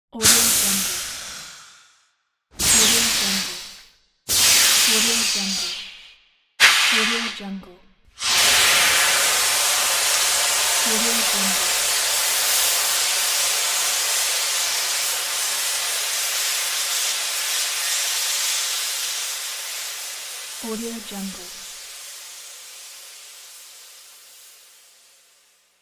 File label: steam